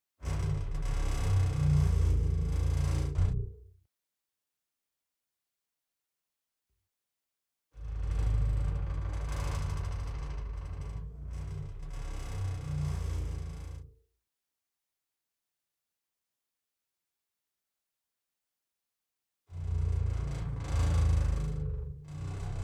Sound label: Metal Structure Creaks